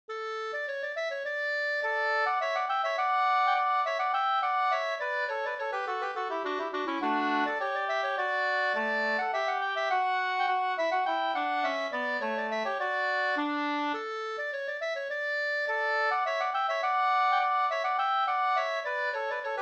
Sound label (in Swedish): slangpolska